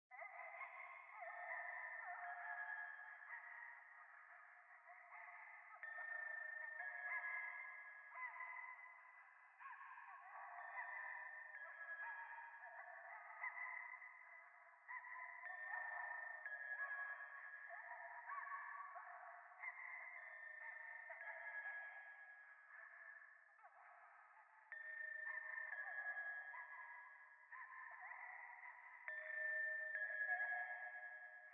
喘ぎ 神社

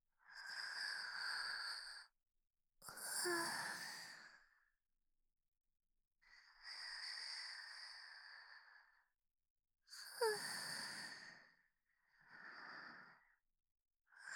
寝息; SEあり

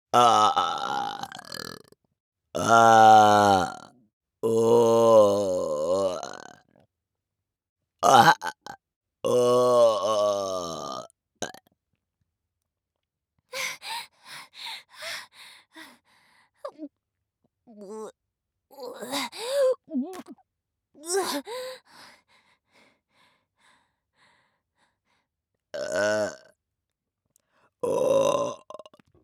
ザーメンゲップ

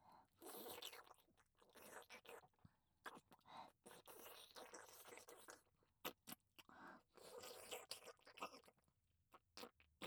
乳首舐め

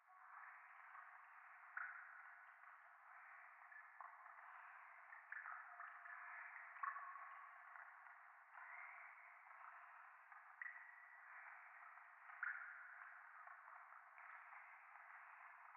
廃墟 右耳密着吐息 雨上がり

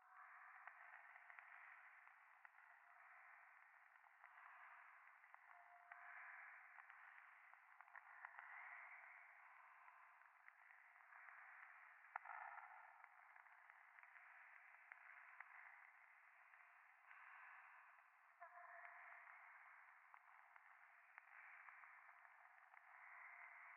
右耳密着吐息 暖炉

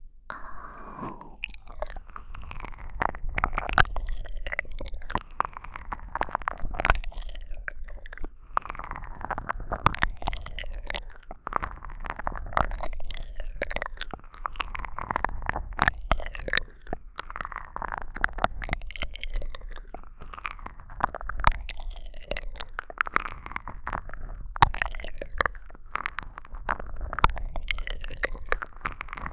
脳舐めコース

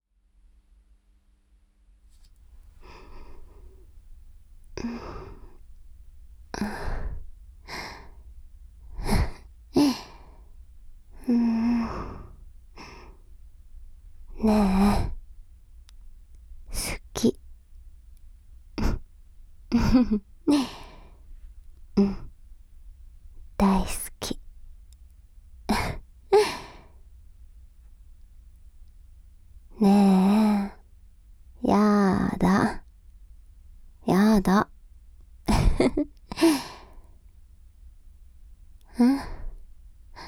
事後の添い寝ボイス かわいい寝息 声のみ; (9)[Exトラック]紗良と事後の添い寝ボイス…♪～愛する彼女のかわいい寝息をゆっくり堪能…♪～

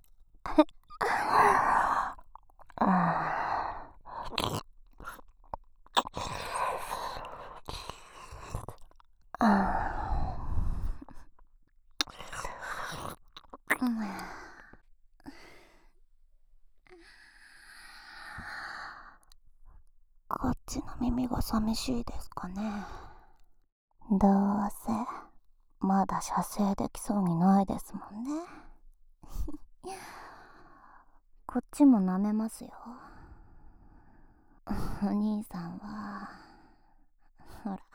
耳舐め; 耳舐めオプション; 乳首いじり専門デリヘル・SSS級美女・ひまり(20)

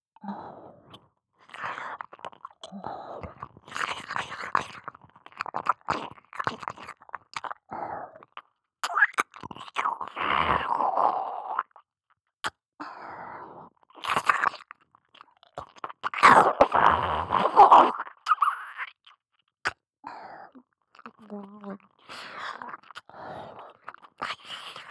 耳舐め 弱い 左耳; マリエッタ

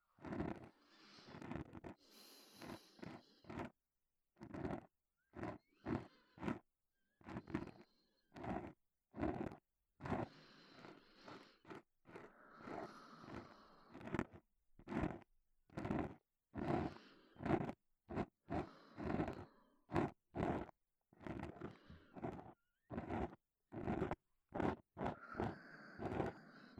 左耳 吐息 耳かき ループ音源; ハクの吐息&寝息; 環境音なし

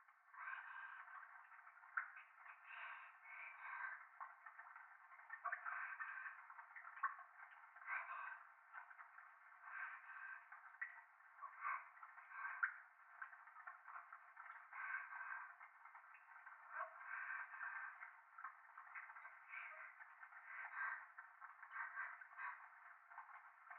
トイレ 左耳 密着 処女 喘ぎ 雨上がり

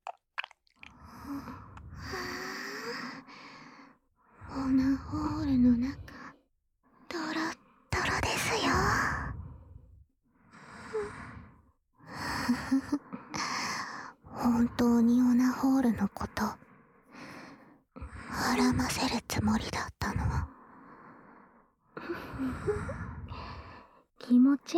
耳元囁き喘ぎ生エッチ比べ; 舐め比べ2

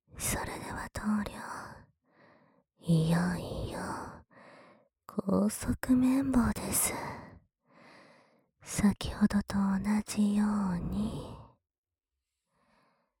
右 唾垂らし高速綿棒, ASMR

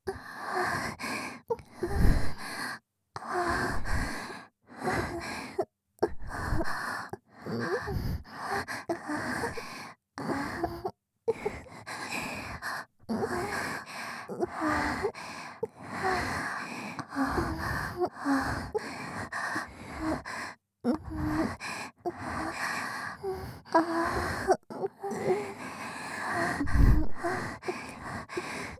ひたすら喘ぎ声ループ

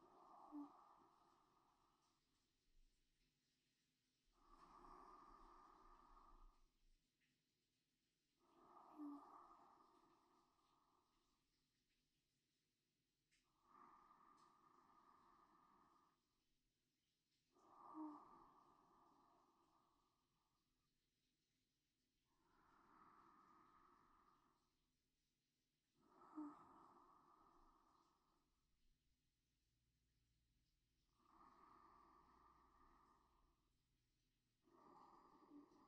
寝息(左側)

